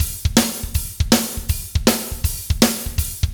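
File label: fast shuffle, Roland drum beats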